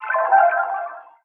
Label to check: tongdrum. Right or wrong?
Wrong